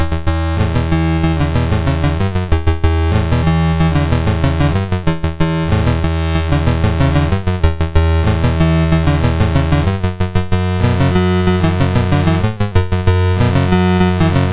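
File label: Magicians Ball, MOS 6581 SID chip music, games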